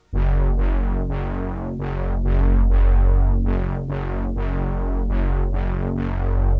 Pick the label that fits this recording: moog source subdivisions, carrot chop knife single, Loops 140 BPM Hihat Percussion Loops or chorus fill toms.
moog source subdivisions